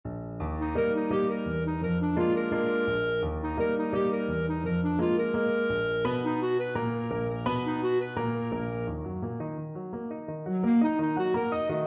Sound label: clarinet